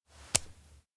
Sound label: slap skin; anemoi